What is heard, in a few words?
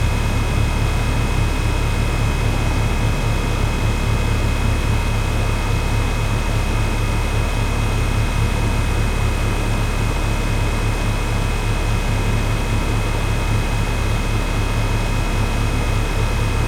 Air Conditioner Noise